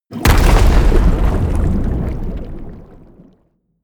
eruption, erdbeben

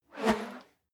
whoosh whistle slow

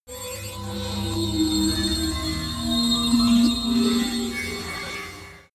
magic warp relocate building

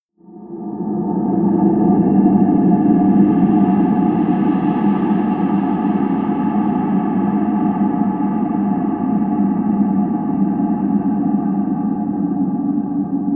drone noise high

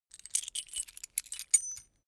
key ring mid size pick one; objekte; schluessel

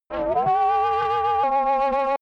crazy science objects, energie

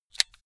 car flip key eject hard

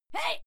female fight scream short soft; schreie; menschen

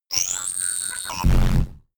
rumble glitch short, sciene fiction

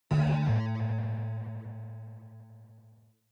protective shield hit absorption buzz; energie

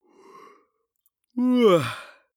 male yawn vocal fake; koerpergeraeusche; menschen; soundpool

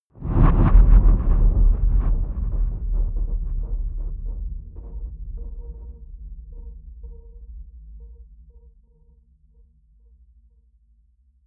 digital epicentre, hits impacts; whooshes rises

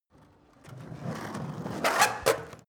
skateboard indoors jump squeak; sport freizeit

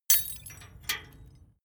metal tools small drop; berufe; handwerker; soundpool

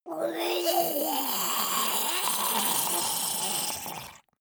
monster die gurgling high; kreaturen

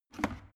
plastic water boiler lift up hard; maschinen; wasserkocher

